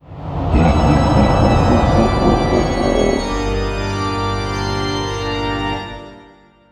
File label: boss summoned fx